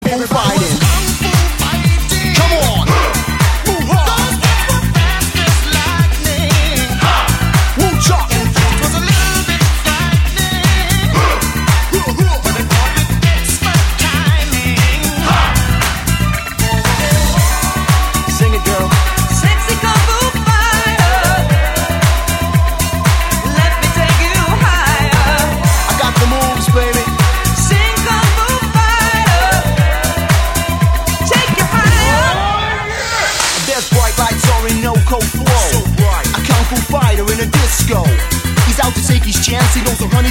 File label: disco